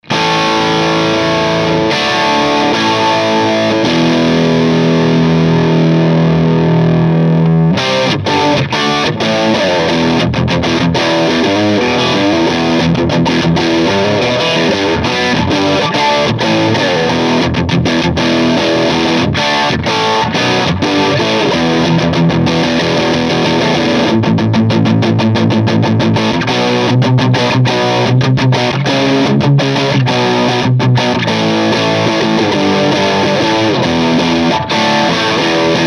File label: bright crunch